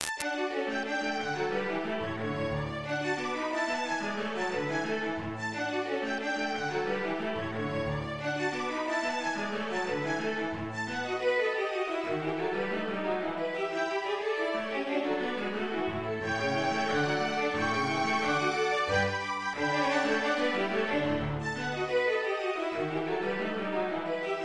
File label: Haydn String Quartet in D major